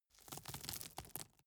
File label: gravel drop soft short